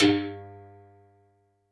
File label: Berimbau; YAMAHA RM1X